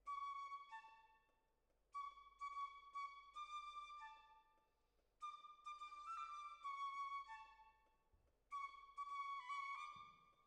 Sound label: Decca, Jazz for piano